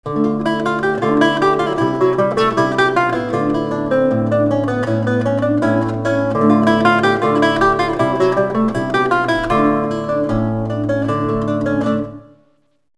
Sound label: lute